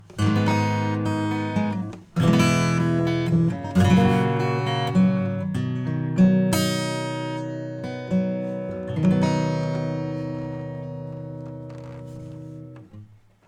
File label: acoustic EQ LOW cut; Joe Chiccarelli